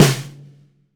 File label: snare, LUDWIG SNARE